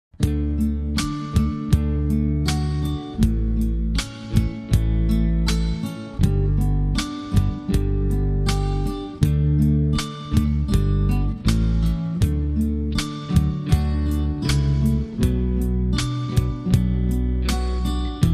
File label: Pedal Steel Ballad No Melody